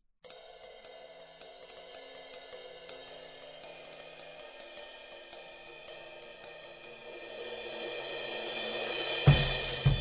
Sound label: Drum Overheads, FET Condenser